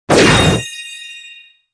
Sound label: PVP ready